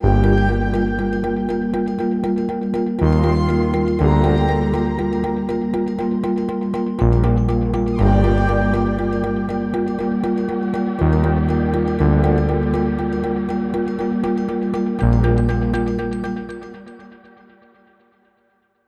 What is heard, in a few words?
120 Am Texture Full